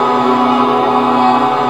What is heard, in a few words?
G#4 VOX; Northstar - The Wizard VOL-1; VOXTAGON